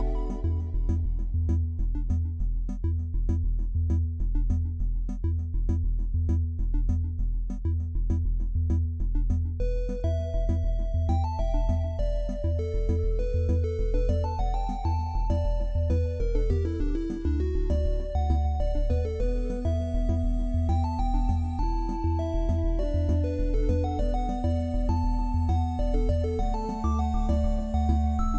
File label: twentyone tranquil loop, chiptune